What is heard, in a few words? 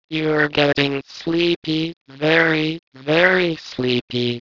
sleep